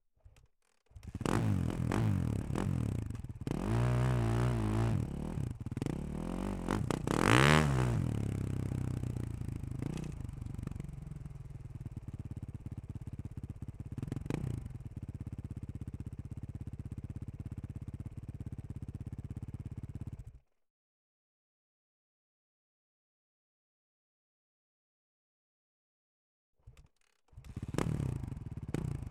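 Suzuki, RMZ450, Onboard, Slow, Drive, Various, Exhaust; 2018; DPA4062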